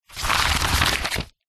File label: household colouring book page turn